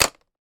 office crayon drop into pencil case full of pens and pencils